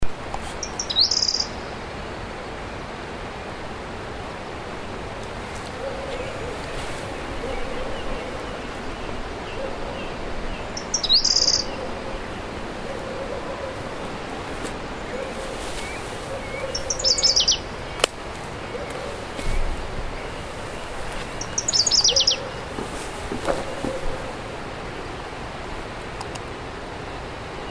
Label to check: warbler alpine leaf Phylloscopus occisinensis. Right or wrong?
Right